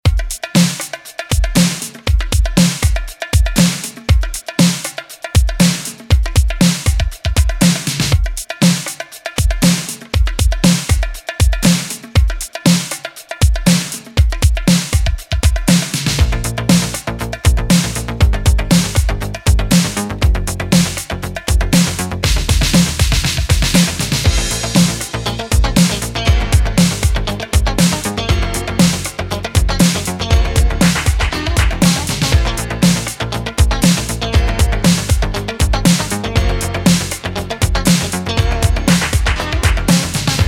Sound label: 80s Remix 119bpm